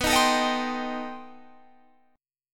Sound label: B 7th Sharp 5th Flat 9th, guitar